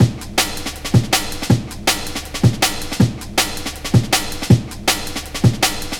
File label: beat, drum & bass beats & breaks; Ebony; Sonic Foundry (Sony Creative Software); Fluid Dynamics Drum & Bass